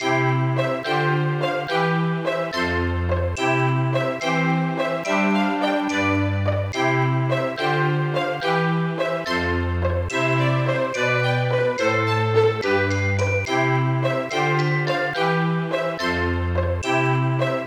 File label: Classic Dance; Dreamer Series Teacher; nds